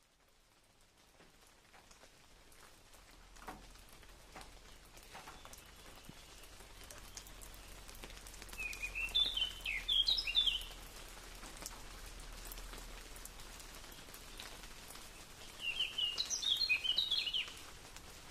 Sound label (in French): ambiance pluie